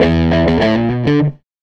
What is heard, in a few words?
SG Guitar Loop